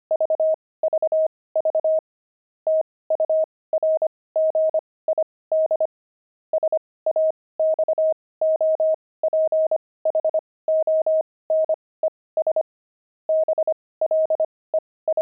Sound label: pangram saxophones